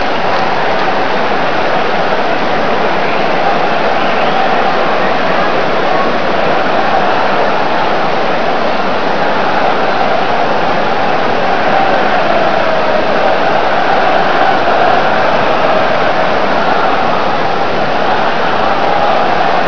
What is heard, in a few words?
ring